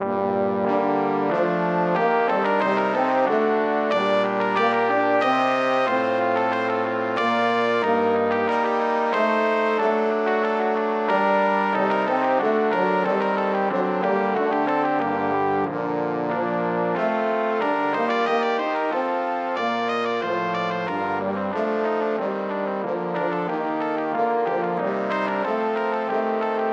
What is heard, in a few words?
rondeau, brass quartet